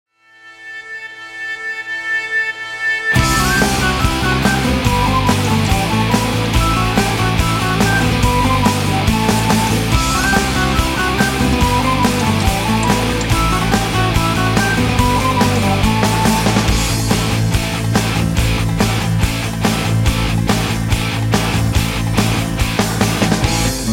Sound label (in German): irischer Jubel